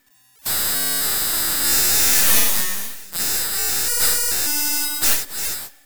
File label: loop, techno atmos